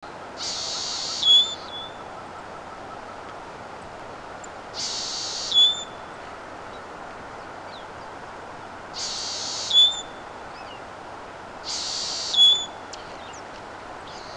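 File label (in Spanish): yal negro, Phrygilius fruticeti, Mourning Sierra-Finch; cantos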